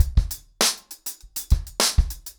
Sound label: 100BPM, ACOUSTIC DRUMS